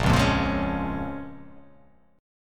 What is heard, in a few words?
Bb-Minor 7th, Bbm7 chord; Bbm7 strummed